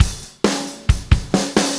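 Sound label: 134 bpm, beat loops; Zero-G; Monster Beats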